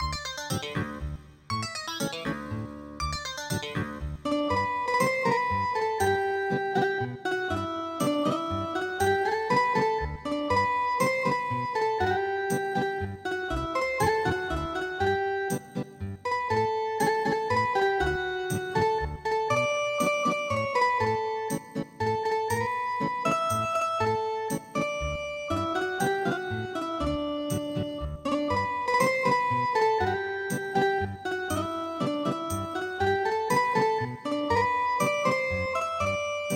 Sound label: Ethnic Guitar